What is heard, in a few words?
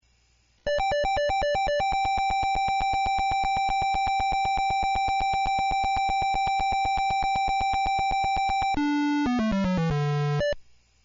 END Lose; othello